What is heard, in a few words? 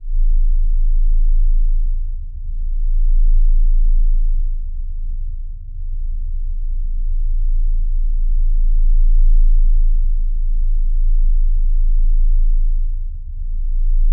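spaceship hum; spacecraft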